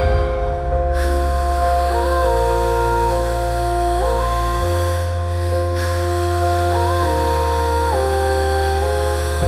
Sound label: Ring Tone